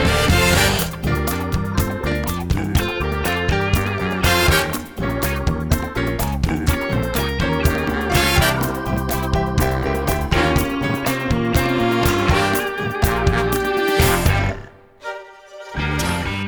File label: callback instrumental